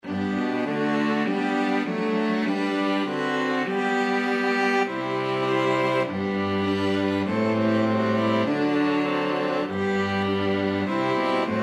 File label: STRQ; string quartet